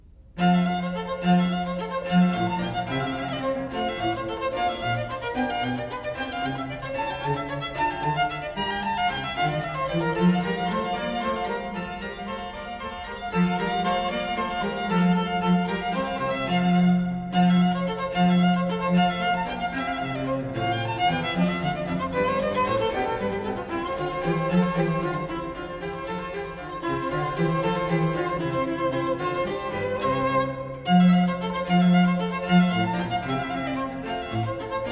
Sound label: Sonata in F major; Vivaldi